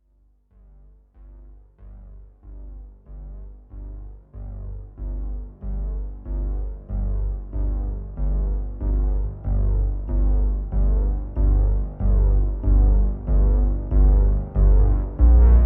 Solo Bass Version; Nordic Drama Textures

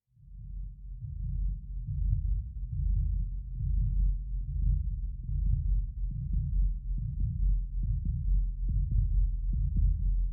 In The Wind Pulsing Drone; Nordic Nights Drones

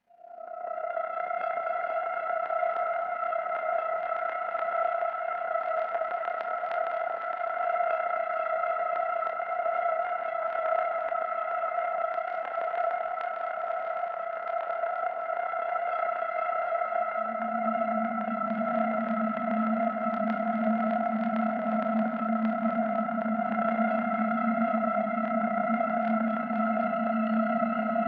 Nervous Drone; Nordic Nights Drones

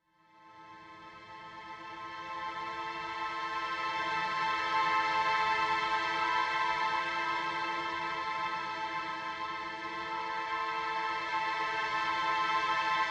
Shimmering Solitude String Drone; Nordic Drama Drones

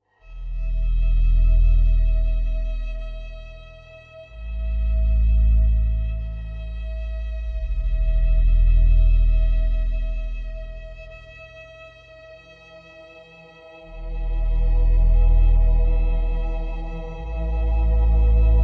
Mysterious Drone; Nordic Nights Drones

